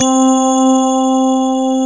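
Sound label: grand piano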